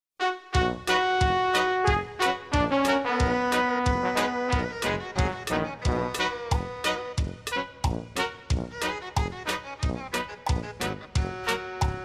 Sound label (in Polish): wersja instrumentalna